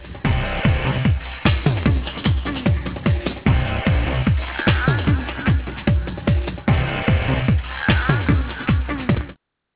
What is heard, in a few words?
music box